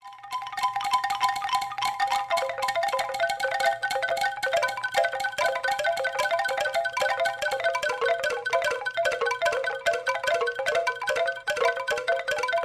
empilade

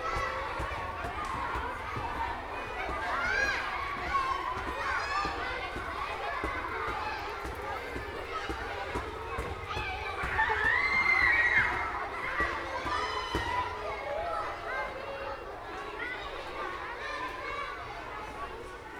cours d'ecole

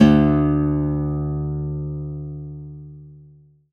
guitare

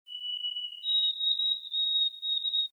bruant

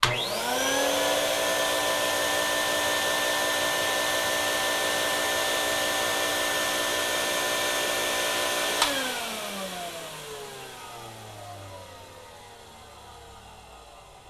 balayeuse

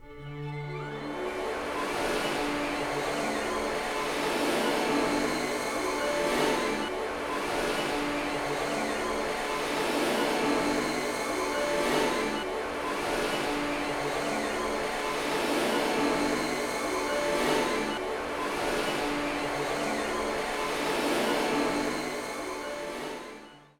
ordre desordre

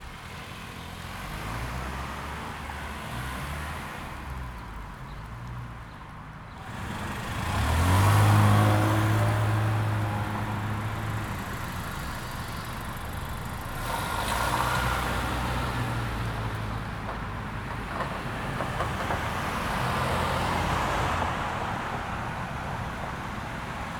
autos au stop

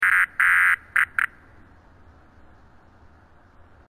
bestiole

